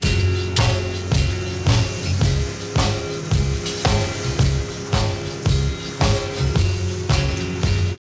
Basic Karaoke

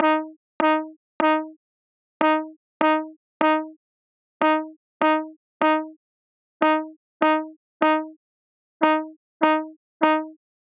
Trumpet vs Trumpet

